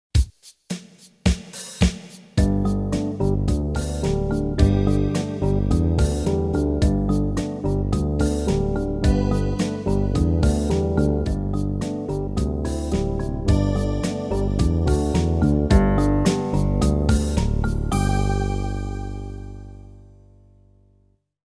Eighth Rest Study, piano

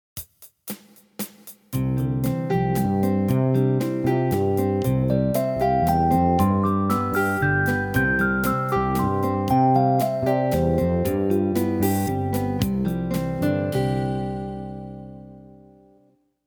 Major Pentatonic Study

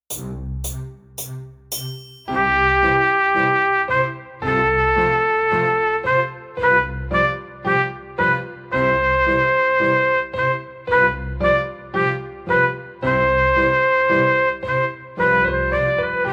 Trumpet Concerto Theme Slow, piano

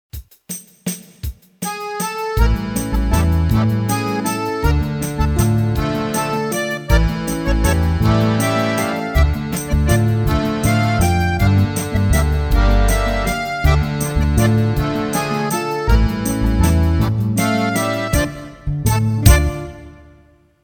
Dark Eyes Rhythmic Chord Accompaniment

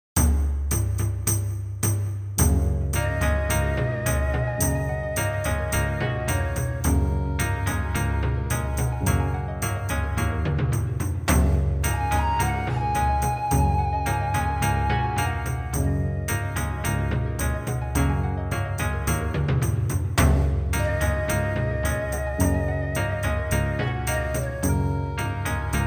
Black Key Improvisation, piano

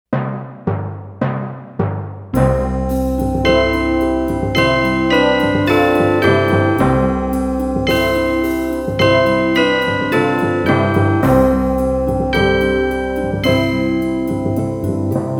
Cathedral Chimes Slow

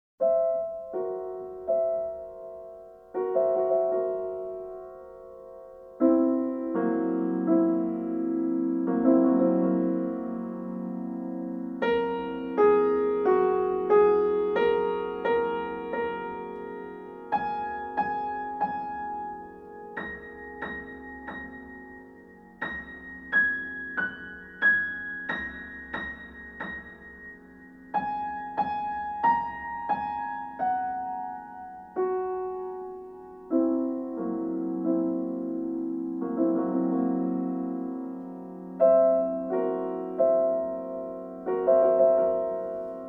piano

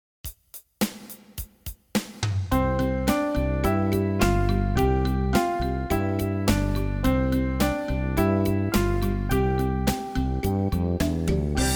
C Pentascale Warm Up Slow

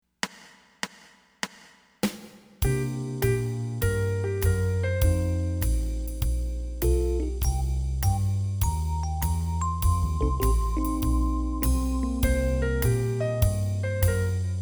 Riff Corner

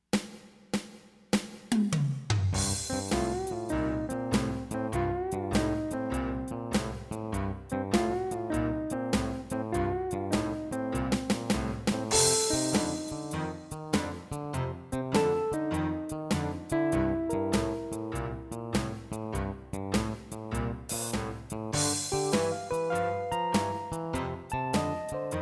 More Barrelhouse Bass

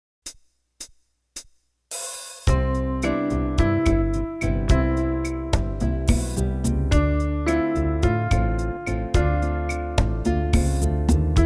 Study In 2nds And 3rds Slow

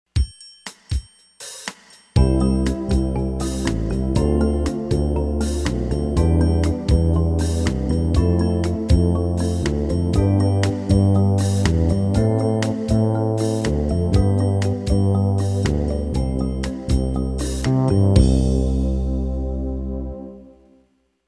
Ascending Scales

